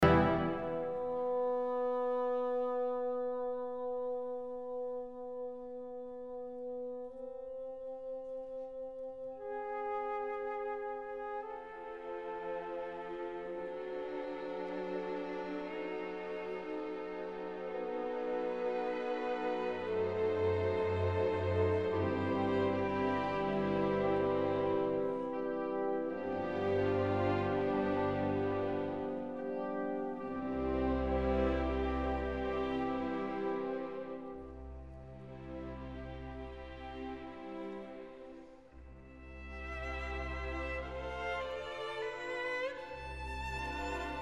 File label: Violin Concerto